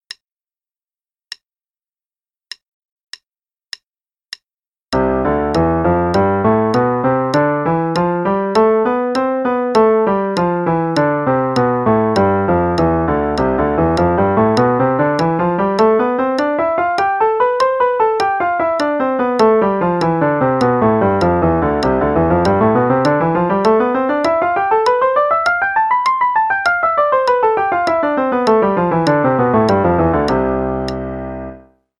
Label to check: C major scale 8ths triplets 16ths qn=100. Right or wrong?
Right